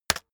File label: keyboard external space hit very fast